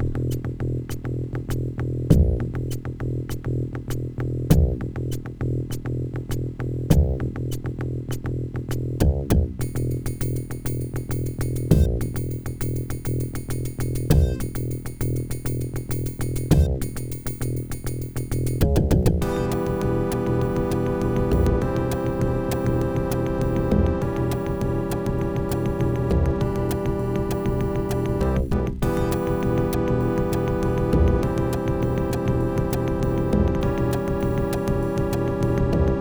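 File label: Level 1 SB CT2770, Sound Blaster; doom2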